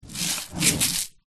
crumbs in metal tin roll on surface; food; golden gaytime; zapsplat

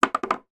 parcel tape roll place down on table; office

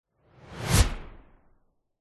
transition whoosh fast; sound design

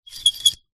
metal small clip slide squeak; foley

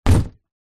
impacts body lean hard against wall

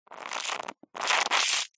cartoon wet liquid suck squelch dribble runny; zapsplat

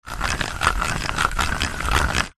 leisure fishing reel turning reeling small; zapsplat

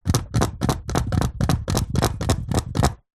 sport tennis balls in plastic tube shake; zapsplat

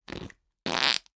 human fart wet squelch

